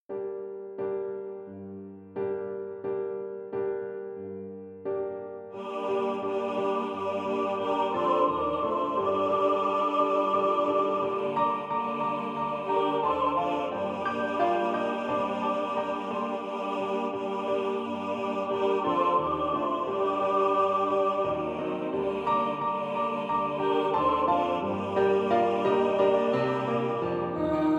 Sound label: Meditation